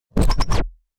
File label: Restart Switch